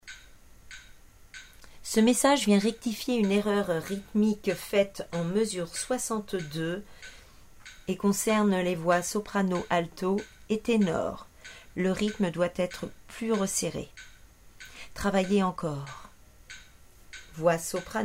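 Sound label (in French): sop. alto. ténor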